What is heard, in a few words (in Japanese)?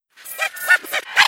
kusukusu; kzdokuroku